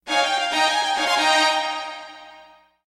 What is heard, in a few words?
fanfare; WoWInterface; AllTheThings